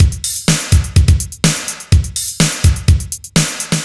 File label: new wave drums